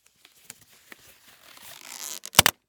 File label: Latex Glove; Medical; Blastwave